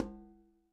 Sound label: CL bs m, KILLER CONGA